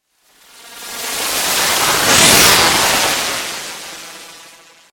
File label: Electrical Shock, Electrical, Shock, Wave, Warble, Science Fiction; 5.1; BlastWave